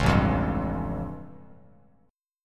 A Augmented 7th A Bass Standard; strummed; JGuitar

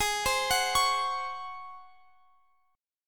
Ab 11th down Guitar Standard; arpeggiated; JGuitar

